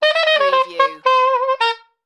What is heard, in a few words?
Sax Lively Riff